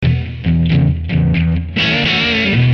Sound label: 90 rhythm blues